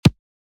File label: Edm Top Kick; EMP